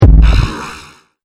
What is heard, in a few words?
Laser Percussion Shot, Laser Gun